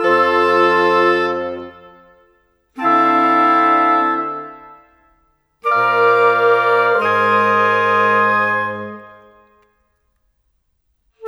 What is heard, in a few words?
Winds, Orchestral, Rock Pop; Sonic Foundry (Sony Creative Software); Orchestral Series VOL-4; Rock and Pop